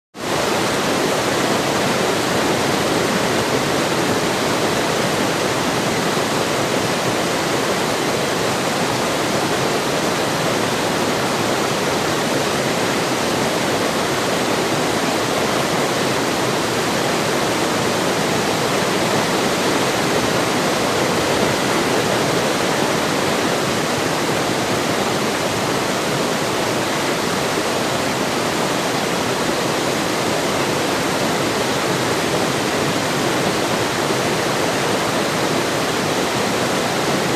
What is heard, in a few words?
River Large Fast Run